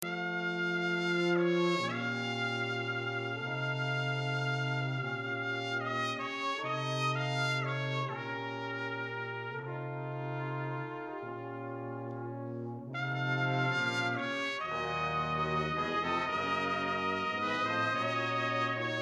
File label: Brass